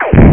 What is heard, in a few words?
laser Fire; Stella Obscura 1.0